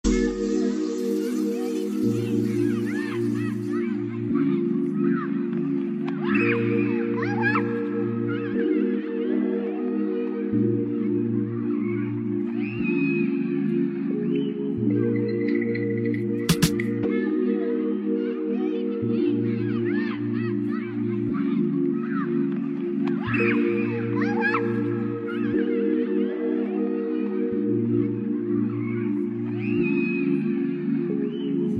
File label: Pretty Bells